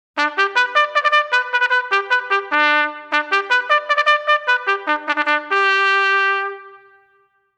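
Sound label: First Call, bugle call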